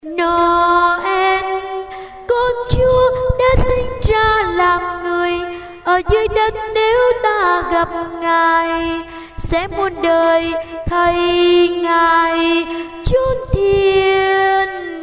alto

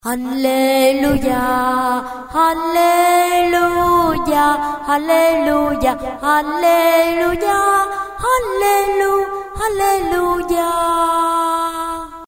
alto

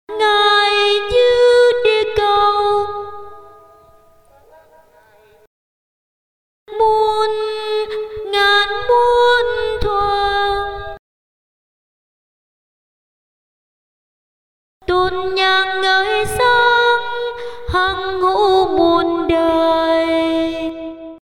alto

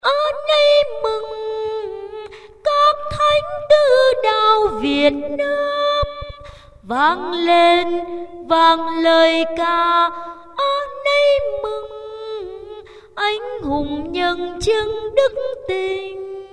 alto

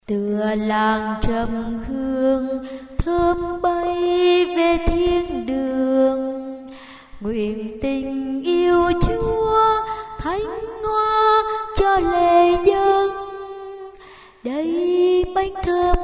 sop alto

